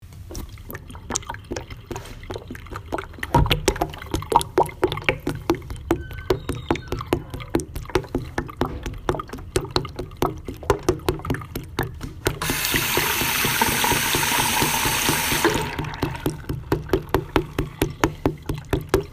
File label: Water Jug